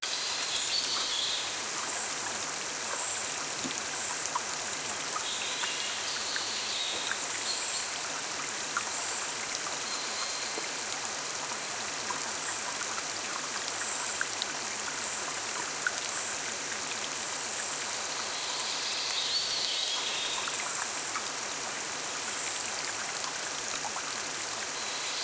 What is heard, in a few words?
the sound of a waterfall